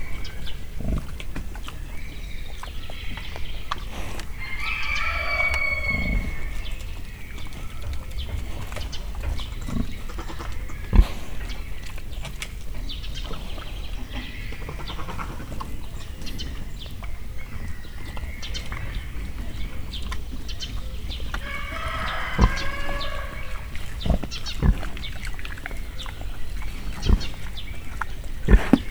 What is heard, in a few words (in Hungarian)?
roffentesek fecske kakas, mangalica; miskolci zoo 2009